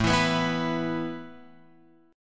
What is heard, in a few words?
A Suspended 2nd, down, Guitar, Open F; Asus2; strummed; JGuitar